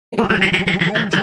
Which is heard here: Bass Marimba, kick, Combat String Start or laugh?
laugh